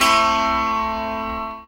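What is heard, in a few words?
ZIMBAL PIZZI; Best Service; World Colours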